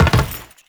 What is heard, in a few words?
Land Metal, Footsteps; Warframe; Teshin